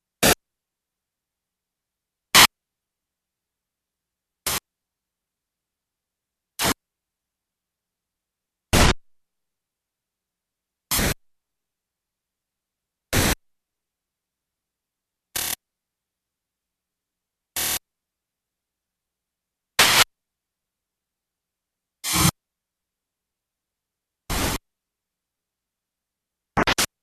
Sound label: noise static, störljud